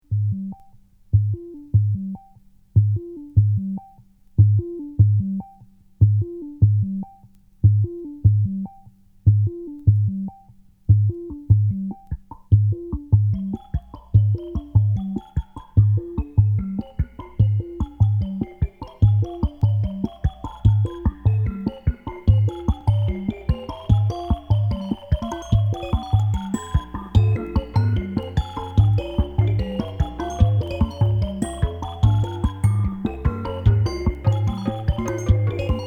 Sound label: bongo moog